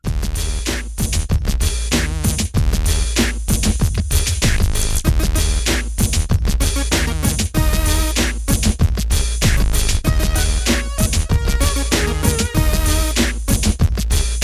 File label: 96BPM, Hip Hop; InVision Interactive; 40 Oz Phat Slammin